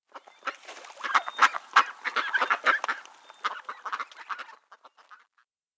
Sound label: ducks; Immortal